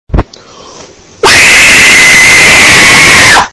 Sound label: demon screech